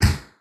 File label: player shield; spider-man-mysterio-rush-game